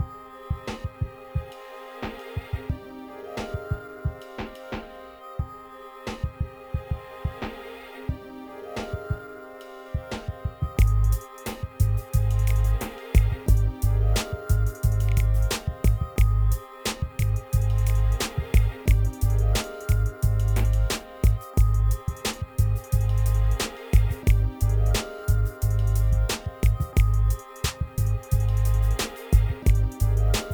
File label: 89bpm aqua abstract hip hop